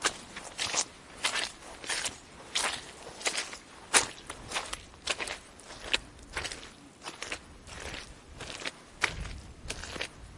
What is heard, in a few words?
footsteps mud